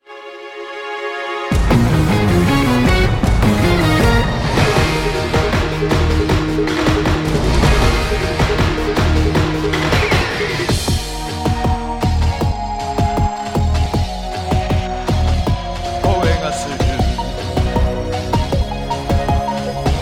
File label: Karaoke; OST Super Sentai; Go Go Sentai Boukenger; Tokusatsus